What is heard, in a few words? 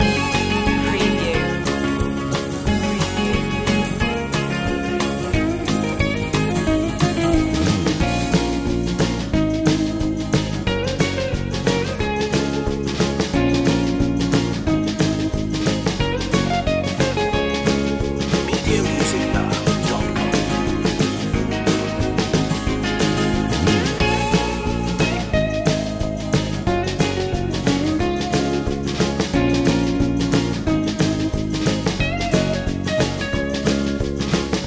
back home loop